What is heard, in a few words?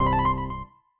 combo power